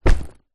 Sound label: foley bag rucksack set down carpet